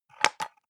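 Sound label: soap dispenser press almost empty short, bathroom; haus-garten; badezimmer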